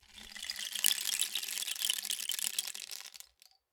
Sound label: Rain Stick, Percussion Samples